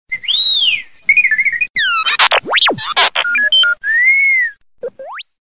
Samsung Galaxy Tab 2 Default Notification

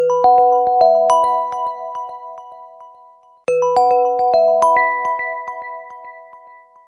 iPhone 12 Pro Max Sms Ringtone

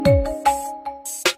sms, ringtone

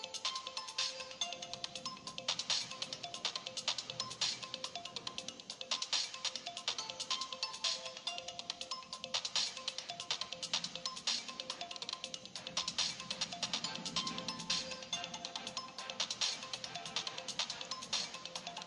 Oppo A77 Mediatek Ringtone

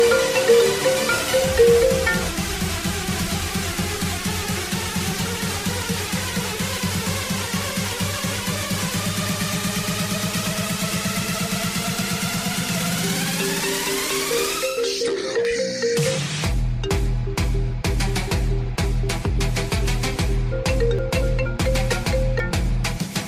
iPhone Electro Ringtone

